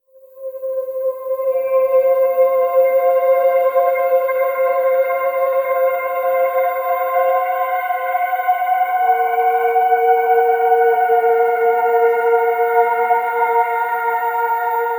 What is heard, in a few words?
ambient meditation astral; SFX Engine